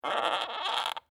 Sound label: Wood Creak Squeak, Old, Handle; Foley; Squeak Sound Effects; Squeaks (Sound Effects:Objects); BlastWave